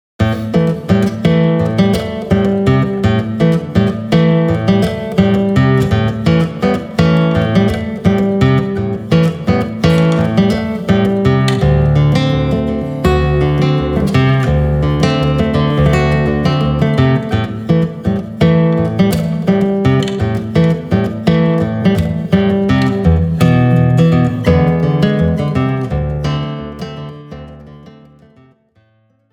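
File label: Guitar; Saitenwege nach Nordamerika Band 1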